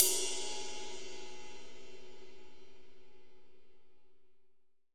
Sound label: ZIL20, JAZZ RIDE CYMBAL